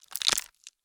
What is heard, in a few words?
BREAK Bright Splinters; stereo; Universal Sound FX; BREAKS & SNAPS